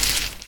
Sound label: step plant; voxelands